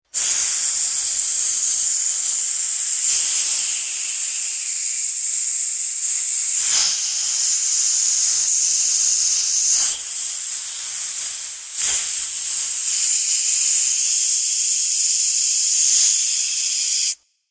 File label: Snake, Hissing snake; Snakes; Animals